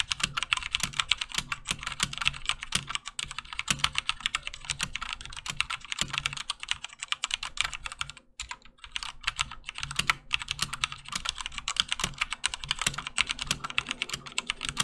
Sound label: Cherry MX Red